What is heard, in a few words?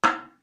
wooden